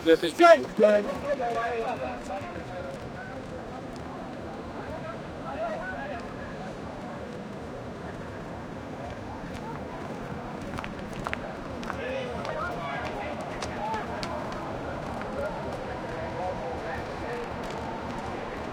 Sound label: large crowd of chinese pe